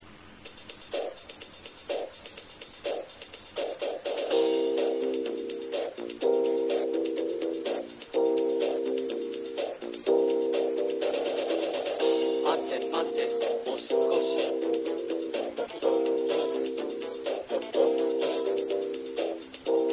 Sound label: taiko